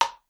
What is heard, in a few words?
Paper Cup Wood Hard